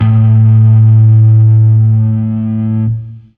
Fuzz A harm

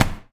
impact

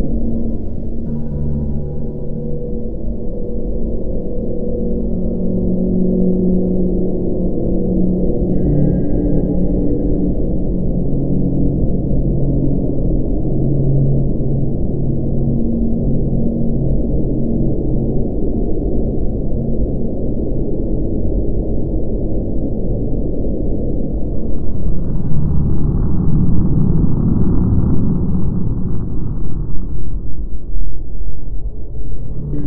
Murky Dungeon (Loop); Dungeon Scavenger; android

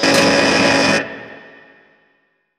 Jail Door Servo Unlock; Prison Architect

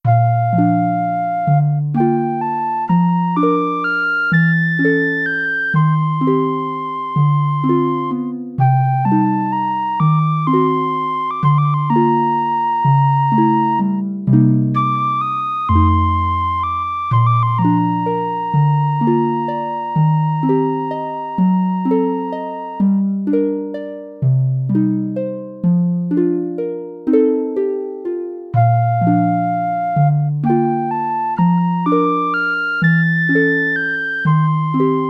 Waltz; Mario Artist; Talent Studio; N64

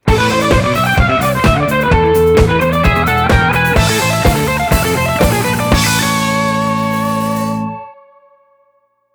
All Clear; Castlebandia

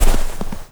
Snow Thud; blastmorph pinball; xbox series xs

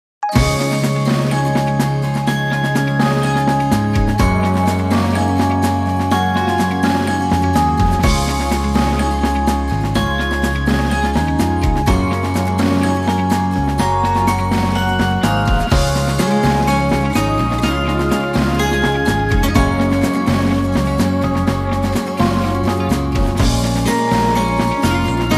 Music Menu event; Jikkyou Powerful Pro Yakyuu 2018; PS Vita

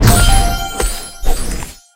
token event card picked; talking tom gold run gamerip 2016

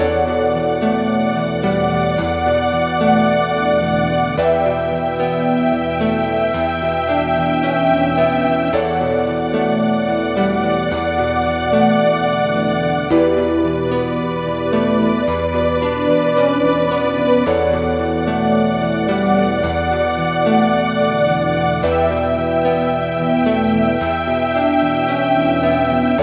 darkbase loop; Time Tenshi 2

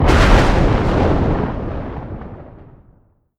Lightning; luigis mansion dark moon; 3ds switch

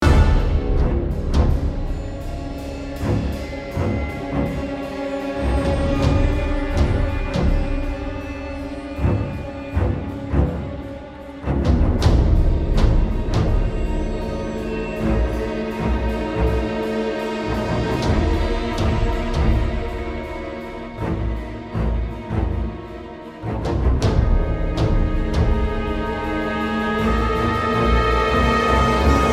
Mx Action Necro Fight

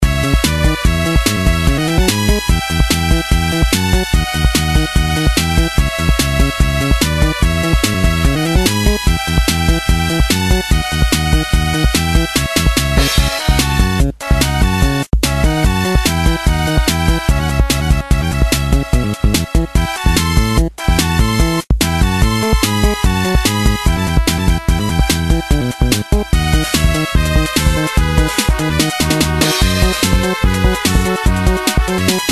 RPG Racer; portaboy

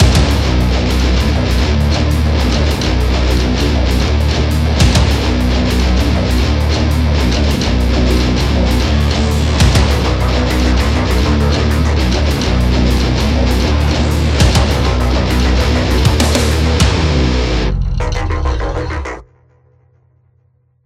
Music Song Intro; Hellbound

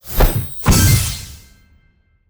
Piece Crossblaster Activate; Ellen's Garden Restoration; android

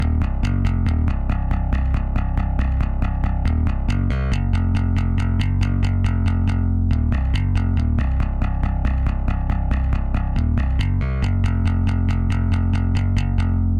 Drums and Jingles Camping Bass; Metal Tales Fury of the Guitar Gods

